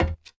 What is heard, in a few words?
clank, player steps; quake2